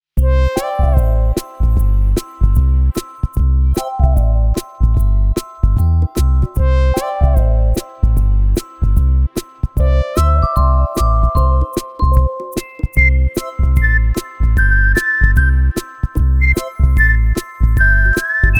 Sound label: Whistling in an Office; Elevator Music